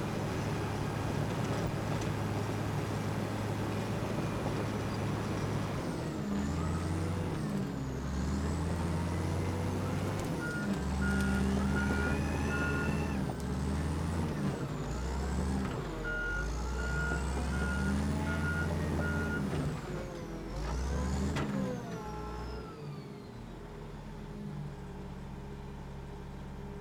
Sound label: bulldozer big cerca; o que arde; oliver laxe